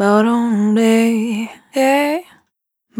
Spin Dry, Single Voice Melodic Loops

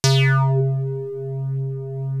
JD synth

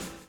BLOW; Spectrasonics - Backbeat

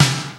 SD, DRUMS; Crypton - DrumBase5000; CD DRUMS #1

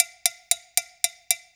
6-8 Cowbell, Perc Loops; Drum Tools

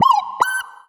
Sci-Fi Lasers - Scan; Antidote Advent

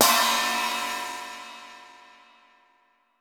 China Cymbal; Roland BK-5

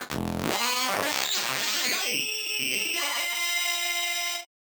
Casio, Glitched, malfunctioning synth

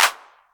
Clap, Novation Drumstation

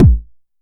TR909 kick, Roland SH-32; Drumset 02

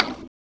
XTRA PERC

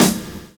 VINYL SD, Drums Dance & Groove

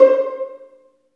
String Vlns Pizz

